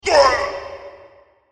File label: Martial Arts Yell "Woiah"; Combat Voice Sound Effects